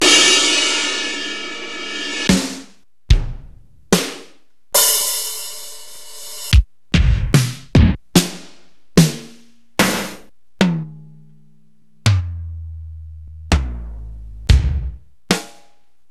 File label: Roland S-550 EQ Drum Kit